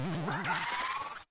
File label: Drill Dig Jump; enemies